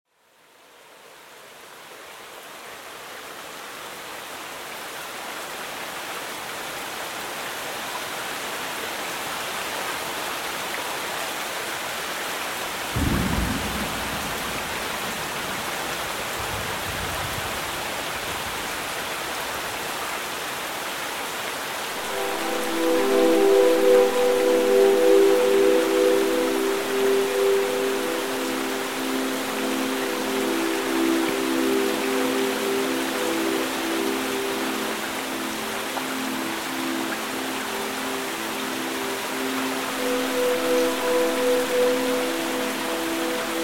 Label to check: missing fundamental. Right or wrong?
Wrong